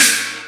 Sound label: SP china; Crypton - DrumBase5000; SP1200 PERCS